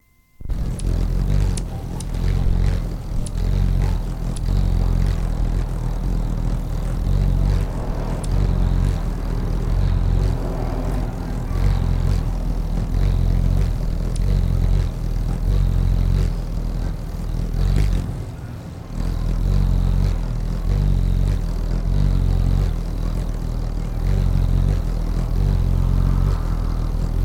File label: Hummingbird